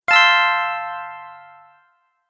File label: Magic Chime Button; Button Sound Effects